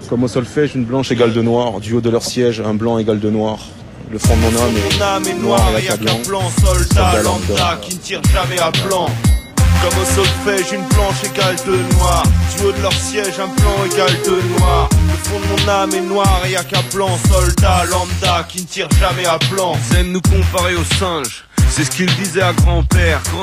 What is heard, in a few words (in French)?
Chanson